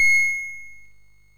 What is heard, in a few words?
sid MOS6581R3